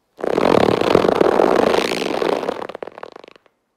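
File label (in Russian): ras, zvuk rastiagivaniia, stretching sound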